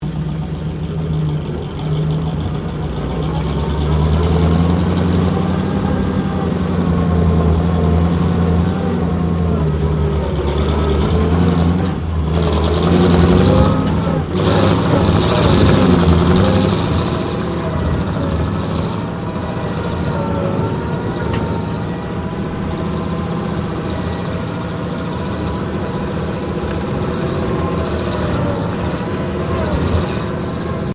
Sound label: TANK BREN GUN CARRIER MANOUVER, British Universal Carrier; Trucks; Vehicles; SoundEffects-Foundation4